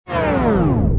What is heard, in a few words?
slow; merge-dragons-2